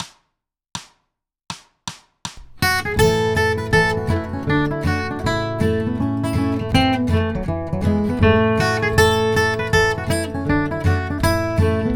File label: guitar Cripple Creek; Jack Tuttle’s Bluegrass Guitar Primer; a tune everyone should know G